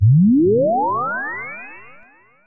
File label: kraftwerky zwoop up; Electro and Synthetic